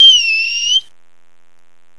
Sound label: plastic; bonampak